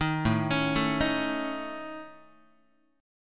A Major Flat 5th, Guitar, AMb5 chord; arpeggiated; JGuitar

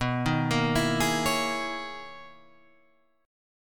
B Major 13th B Guitar Half Step Down

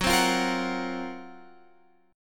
Gb Major 7th Flat 5th Guitar Open F

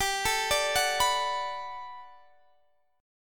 B Minor 7th G, chord; Bm7/G arpeggiated

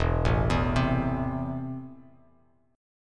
E Suspended 4th Sharp 5th, Bass Standard; arpeggiated; JGuitar

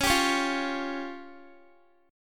Db Major Flat 5th, Guitar; strummed

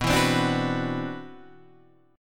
C-Major Sharp 11th B, guitar

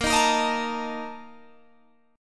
Bb Major 7th Suspended 4th, Guitar, Open G; BbM7sus4 strummed

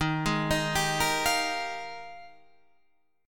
F Minor 11th, guitar chord

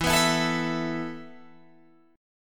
E Suspended 4th E, down, Guitar, Open G

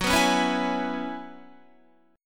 F sharp 9th Suspended 4th F sharp Guitar; F#9sus4 Chord; strummed

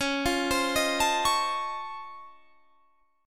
C sharp Augmented Major 9th C sharp; down; chord shape

